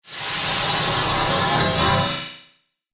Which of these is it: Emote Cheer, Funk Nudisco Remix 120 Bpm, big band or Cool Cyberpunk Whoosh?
Cool Cyberpunk Whoosh